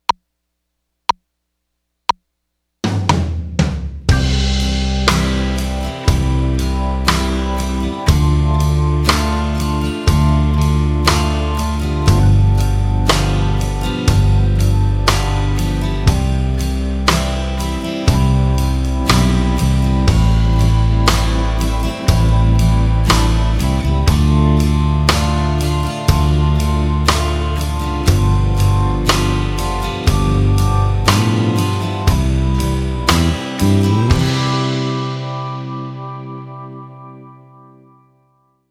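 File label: Chord Play Without Guitar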